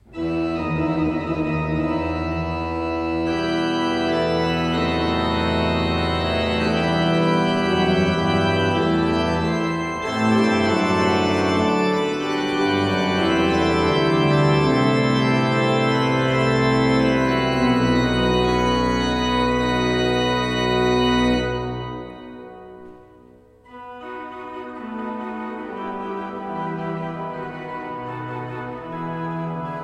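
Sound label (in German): Orgel, pfeifenorgeln; Seifert Kevelaer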